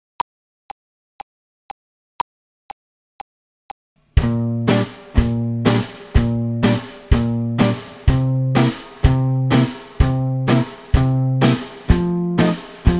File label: two beat slow